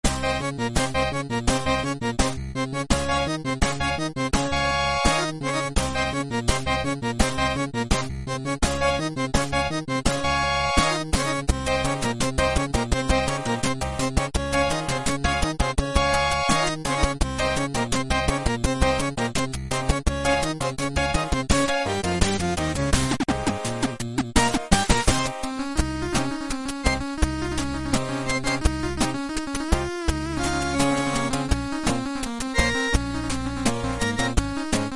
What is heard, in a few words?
music